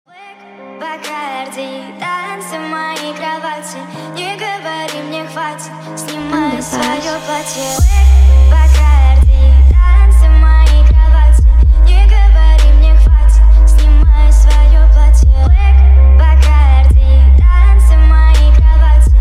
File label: low bass